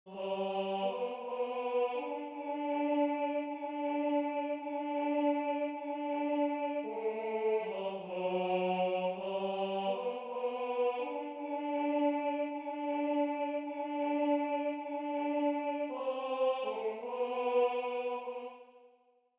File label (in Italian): alleluia irlandese tenore